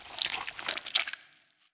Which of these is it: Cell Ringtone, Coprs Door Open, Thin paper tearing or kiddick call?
Coprs Door Open